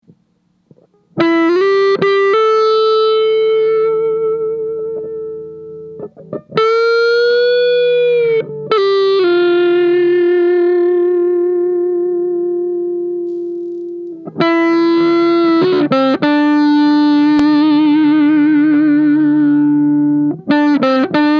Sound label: bloom, neck pickup, with the tone control turned all the way down to get that "woman" tone. I'm just picking single notes in an Am pentatonic